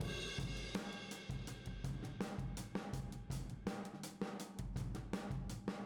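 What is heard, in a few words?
Boogaloo, Room Mono, 82 BPM; Drums; Verse; Sonic Foundry; Drums from the Big Room The Mixes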